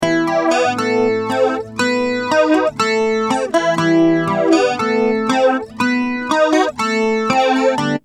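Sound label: acoustic guitar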